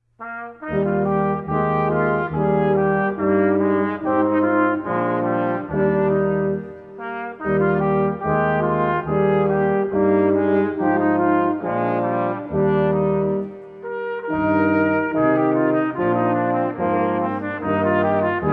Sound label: Brass Quintet Sleepers Awake; Johann Sebastian Bach